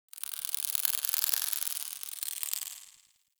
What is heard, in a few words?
Foliage Insect; Ambience; Props; Warframe; Duviri